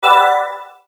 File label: Shine